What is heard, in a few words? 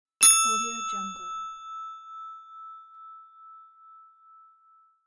elevator ding